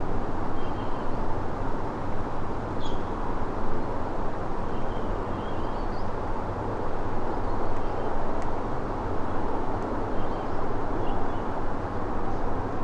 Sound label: Empty Forest